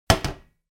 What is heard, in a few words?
mug down